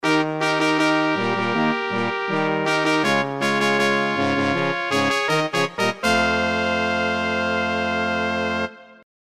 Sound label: fanfare